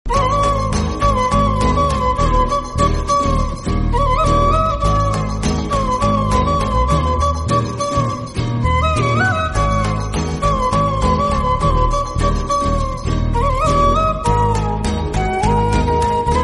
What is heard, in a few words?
malayalam movie bgm